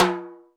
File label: HR16 timbale; Crypton - DrumBase5000